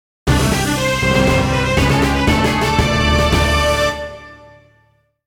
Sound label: BIG Fanfare; METAL SLUG; SNK Playmore Slot Panic